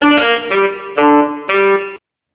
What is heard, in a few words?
saxy, phonetones; Alcatel OT-756